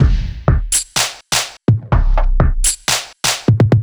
125 Steam Beat 707

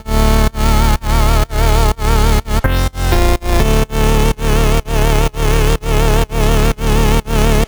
House Bass Loops D 125; Ghost

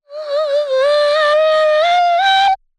Vox Girl Dream Dry

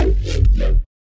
Mid Range

